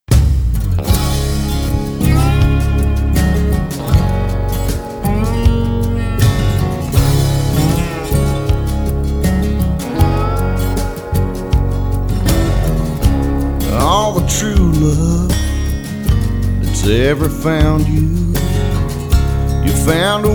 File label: Mid tempo Male Country Life Lesson Song